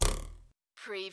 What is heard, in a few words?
CARTOON RULER TWANG